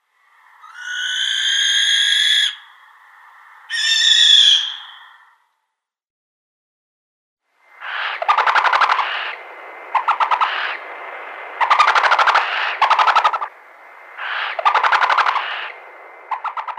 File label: Barn Owl female at-nest